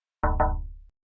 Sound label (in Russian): stuk po steklu